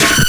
breakbeat

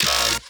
wobble

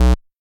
bass

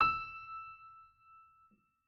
piano

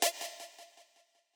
stab

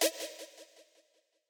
stab

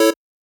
Chord

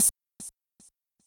voice

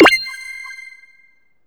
SE STAMP GET PITCHUP; maimai

